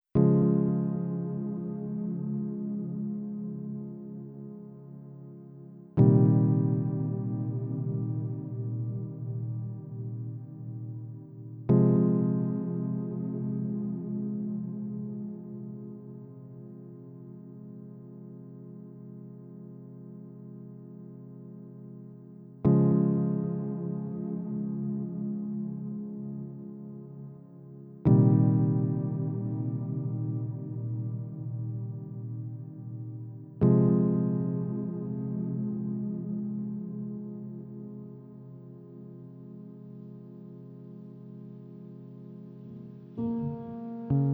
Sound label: Synth